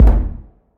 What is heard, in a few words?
nice steel kick drum sample g sharp key